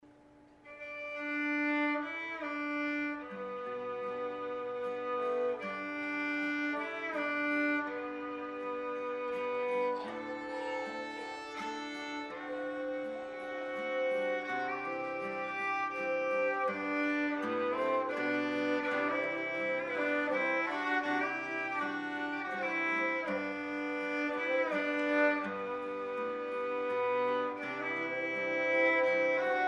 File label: instrumental silent night